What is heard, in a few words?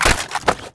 clip out